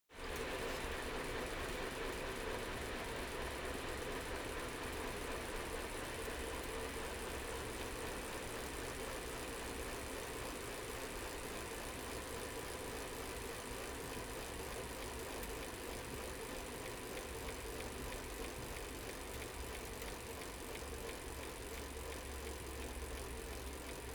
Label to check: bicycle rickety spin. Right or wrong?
Right